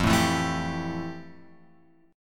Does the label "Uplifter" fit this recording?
No